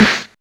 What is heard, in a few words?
warm sounding snare single hit a key; snare-drum-samples